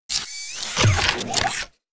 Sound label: Menu Open Container; Custom UI Sounds; Call of Duty Advanced Warfare